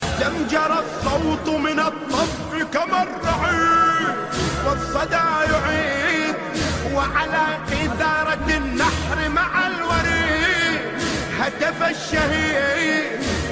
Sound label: arabic nohay ringtone